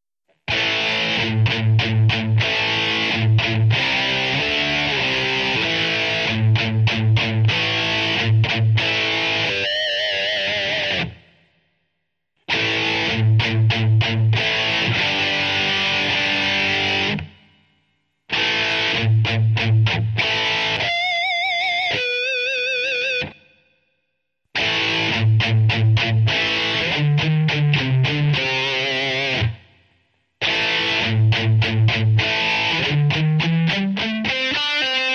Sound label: Rock guitar; Total Rock gt